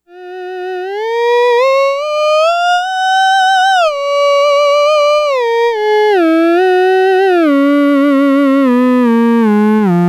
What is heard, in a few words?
ews new board, theremin; ews buffer exciter